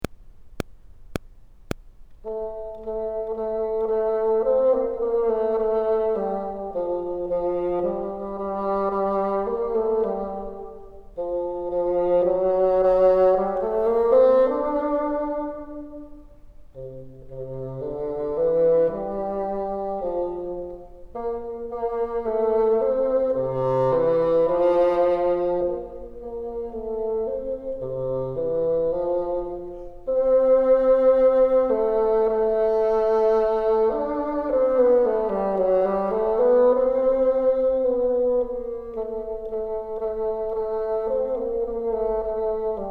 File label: top, bassoon